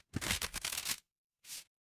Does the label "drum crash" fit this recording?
No